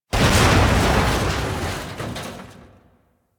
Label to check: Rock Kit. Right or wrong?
Wrong